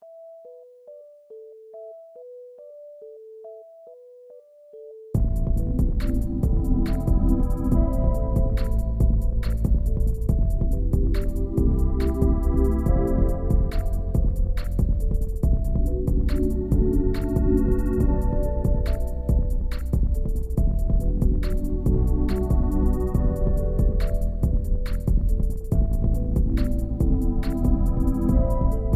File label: music (aquarium flashback combat); unsighted; aquarium flashback 3 combat